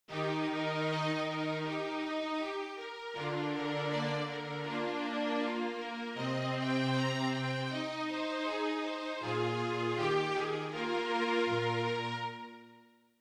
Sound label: adagio; Arcangelo Corelli